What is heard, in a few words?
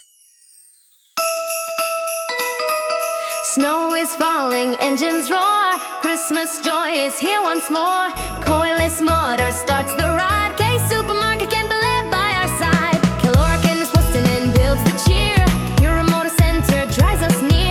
Sponsors xmas jingle